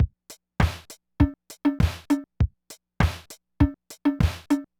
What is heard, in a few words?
Loop, drumtraks